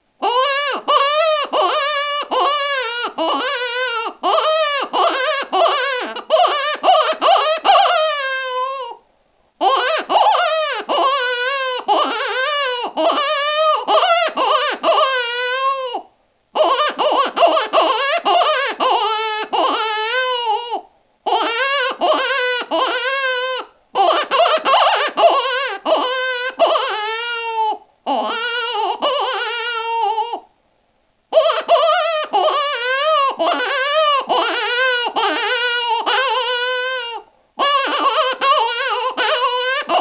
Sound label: cow tip jack, rabbits